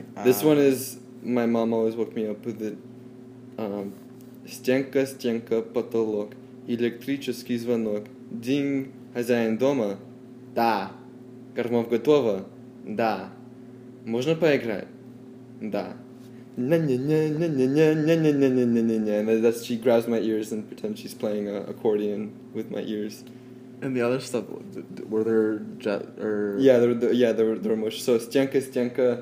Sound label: Morning Accordion Song